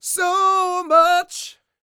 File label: SING W, Vocal Planet Blues & Soul, BLUESY; ILIO